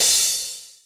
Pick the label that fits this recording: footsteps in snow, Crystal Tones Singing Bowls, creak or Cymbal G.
Cymbal G